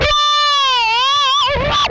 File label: whammy fx, guitar